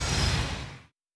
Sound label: Sound Effect Population Decrease Fast